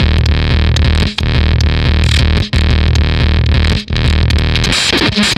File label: Fuzztest drum fuzzfactory